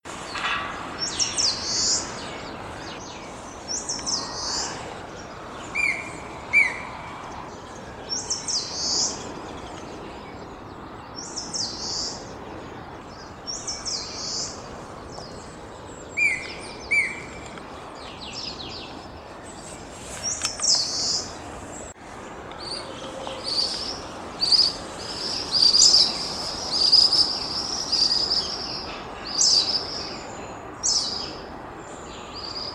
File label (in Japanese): sanshoukui; senndai